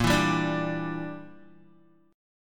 A sharp 6th Flat 5th, Guitar; A#6b5 strummed; JGuitar

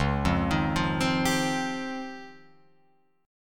C sharp Major Sharp 11th, Guitar Open F; C#M#11; arpeggiated; tuning=Open F; guitar chord

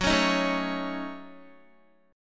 Gb Minor 6th, Guitar; Gbm6; strummed; x,9,11,8,9,8

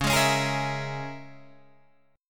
Db Minor 6th Db down Guitar Open D

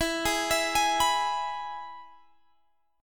E Major guitar chord, Standard; arpeggiated; JGuitar

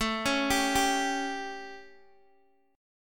A 7th, Guitar DADGAD; chord

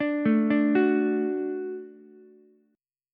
F sharp Minor Sharp 5th, Ukulele; arpeggiated; JGuitar

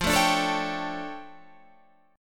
D Minor Major 11th, F

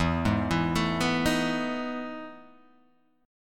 E Major 11th E, Guitar, Half Step Down

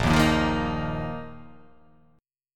A sharp Suspended 4th; strummed; A#sus4; JGuitar

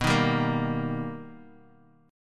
B Augmented Major 7th B, chord

